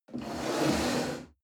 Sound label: chair wood slide on floor medium